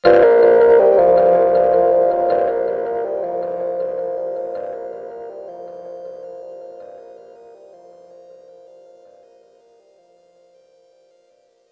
Overlay Tone

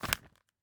paper grab; teardown ps5 windows xbox series xs gamerip 2022

